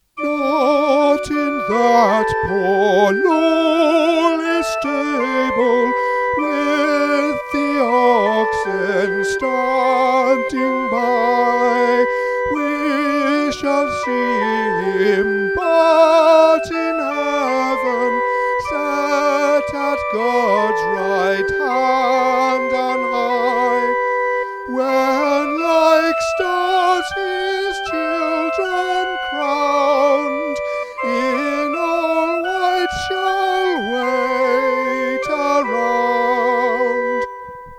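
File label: Once In Royal Davids City Soprano Descant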